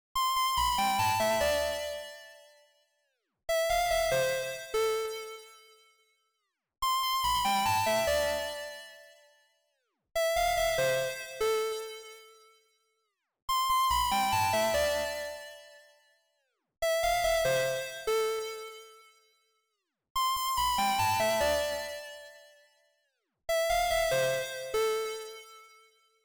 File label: 144 BPM A Min Square; Melody; Loop Stems; Cymatics; Cymatics - 2022 Melody Collection - Wavy; Zoo